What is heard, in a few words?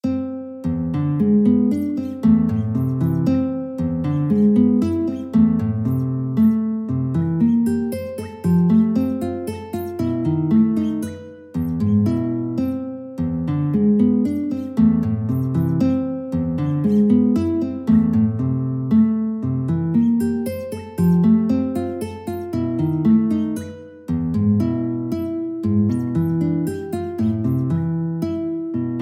sad, guitar; fuchs